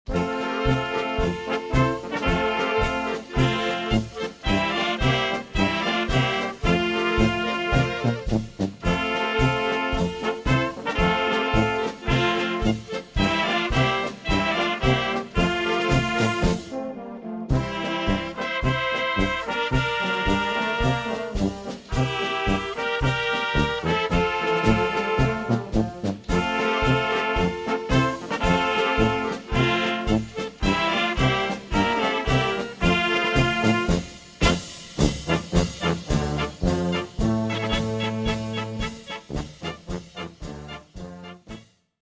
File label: Polka